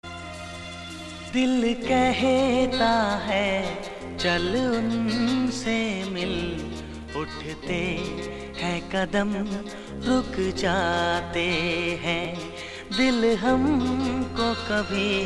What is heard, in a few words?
mix ringtone, bollywood ringtones